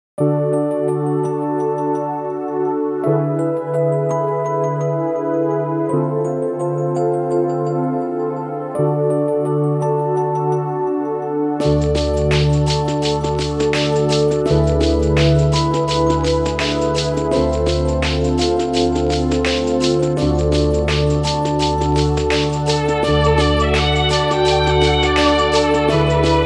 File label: The Clock is Ticking; Tea Society of a Witch original soundtrack 2002; Majyo no Otya Kai